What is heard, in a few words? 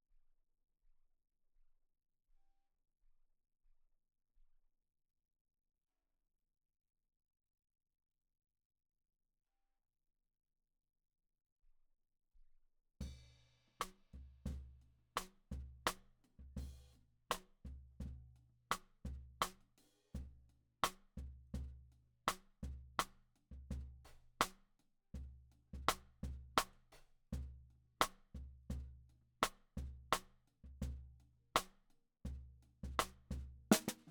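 Snare Bottom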